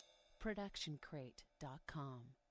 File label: ride cymbal 20 inch; soundscrate